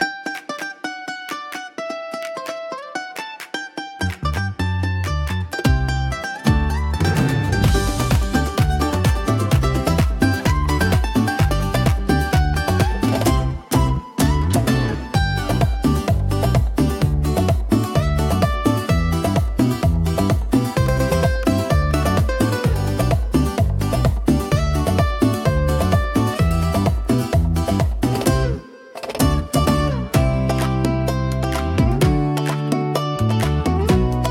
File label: sunny latin groove soundtrack; sol latino